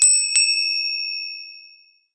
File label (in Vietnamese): tieng coi xe dap